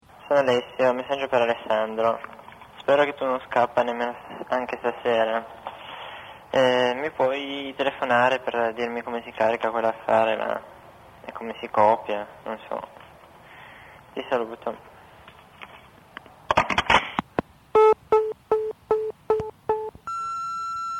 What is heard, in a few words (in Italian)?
beat spero che tu non scappa